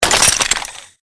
Created Magic Spell Ice Bolt Impact; Series of created spell sounds

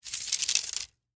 Arrow From Quiver, Warfare, Arrow, From, Quiver; Bow And Arrow Sound Effects; Combat

